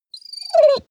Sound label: dog whine trill; natur-tiere; hunde